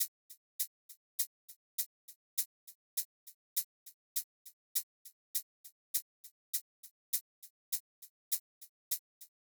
Drum Loop - 101 BPM Shaker; Loop Stems; Cymatics; Vibes II Drum Loop Collection; Windy

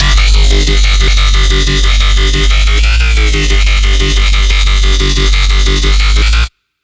Bassline C3 140, Dubstep; GhostHack

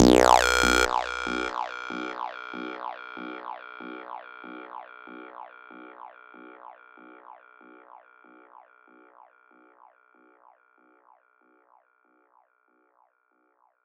Squelch B 142 BPM, Psytrance; Ghosthack; Psytrance Visions Volume 2

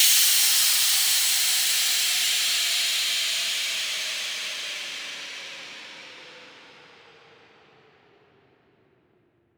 Piped White Noise Down; House; FX

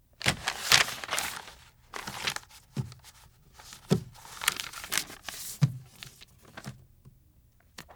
Map Handle; Fairlight Sound Lib

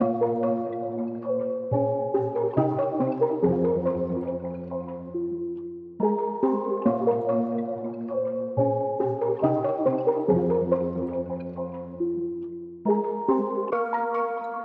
Aquatic 140 BPM F Min; Cymatics; 2022 Melody Collection

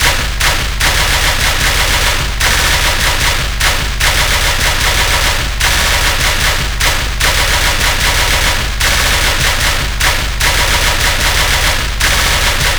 Bass Loop F 150BPM; Dubstep; Shockwave; Ghosthack; Advent Calendar 2022

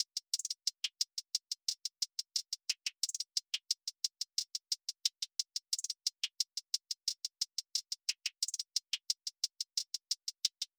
Drum Loop 89 BPM Hihat; Loop Stems; Cymatics; Vibes II Drum Loop Collection; Thinking

